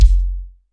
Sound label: BD, DR BD; Best Service Gigapack 2